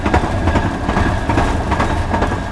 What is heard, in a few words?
Run, Train; OpenBVE